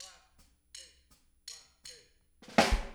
Intro Kick, drums, 162 BPM

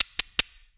VOC DRUM, MOUTH PERC; Best Service Hyper Dance

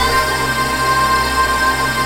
PAD, Dance Mega Synth

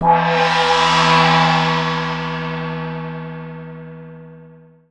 WU HANG G, GONGS; Voice Crystal - Steve Reid Definitive Percussion

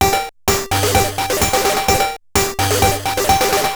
Bent TR505 (128BPM), Circuit Bent

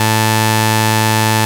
Pro 1 SAW A3, Vintage Keyboards; Big Fish Audio; First Call

